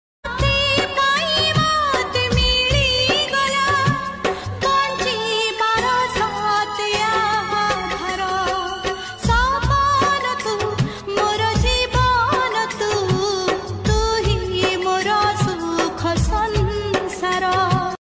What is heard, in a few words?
bhajan odia bhakti song; jagannath